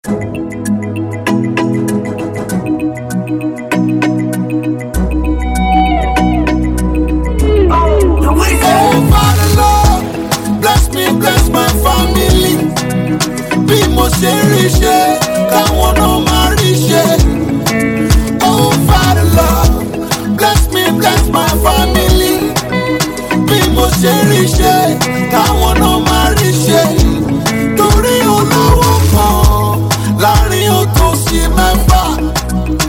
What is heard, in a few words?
instrumental